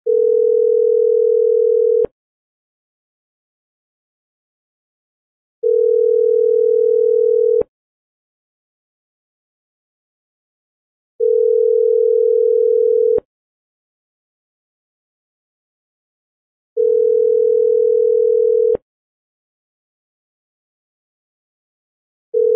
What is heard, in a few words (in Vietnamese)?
nhac cho zalo mac dinh tieng tut tut tut